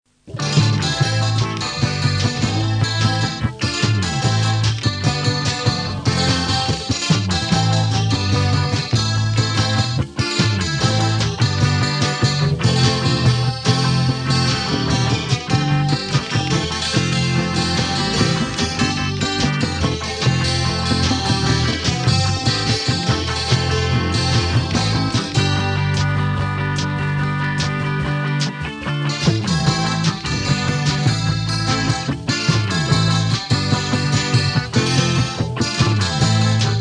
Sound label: bouzouki groove